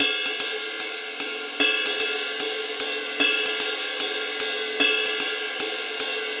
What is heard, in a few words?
Ride Cymbals, регги